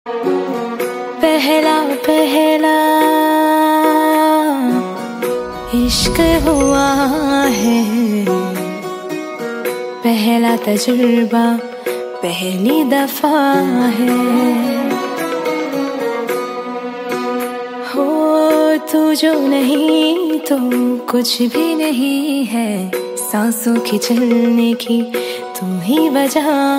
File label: Female Song Ringtone